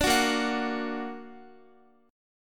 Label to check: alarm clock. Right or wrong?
Wrong